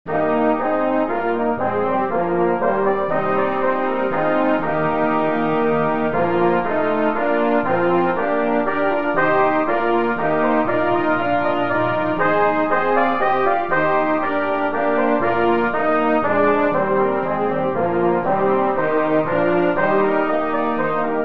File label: Hymn